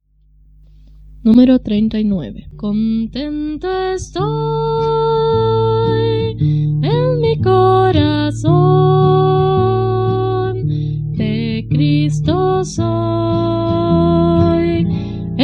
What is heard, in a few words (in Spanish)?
Coro, himnario niños